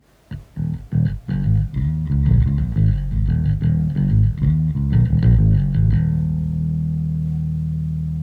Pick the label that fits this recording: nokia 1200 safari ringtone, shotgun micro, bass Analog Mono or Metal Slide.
bass Analog Mono